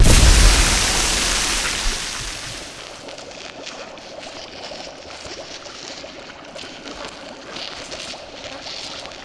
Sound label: nethertoxin; viper